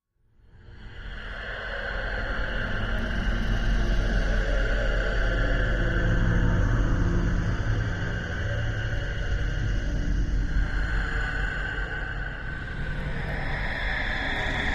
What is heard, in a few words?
Hollow Soul Dist Amb Fea, eerie ambience